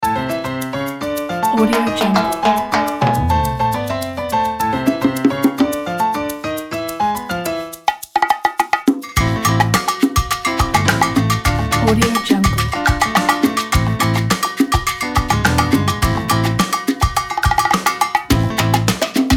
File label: latin salsa